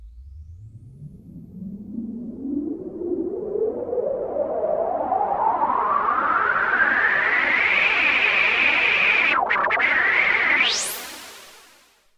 Juno-60, Filtered Noise

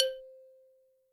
Xylophon, Yamaha DTXpress

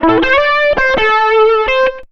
SOLO R, Electric & Acoustic Guitar Loops; USB Soundscan vol.04; AKAI; 120 SOLOS

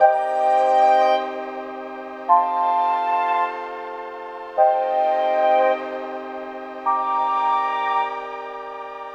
Synth Pattern; Electrocution

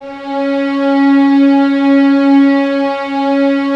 VNS C#4, Violins; 23 Violins; String Ensembles; Miroslav Vitous

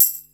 tam hit, Yamaha Motif ES drum machine; 300 Drum Machines; Yamaha Motif ES-Series

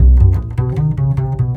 BASS, Drum & Bass, 150 BPM

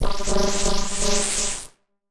Casio, Glitched, malfunctioning synth

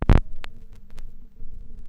Stylus Noise, Vinyl Noise; noise hiss crackle

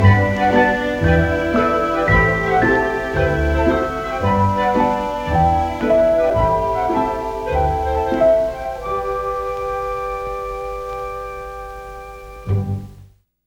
RAIN; Roland - LA Composer vol. 2; FloodSet

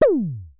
Synare Filter; Star Instruments Synare 3

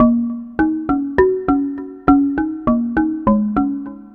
Marimba Low; Ambient; Melodic; Ambient-Melodics

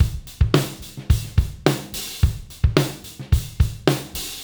108, Beat Loops; Zero-G; Monster Beats

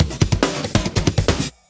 Fills, Loops 140 BPM

